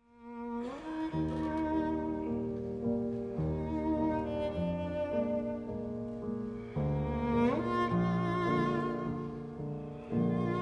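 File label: Beethoven piano Trio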